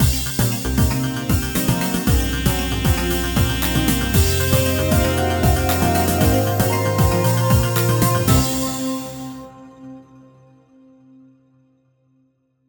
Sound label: Intruder Confirmed; gun point; Crosswire (Unused)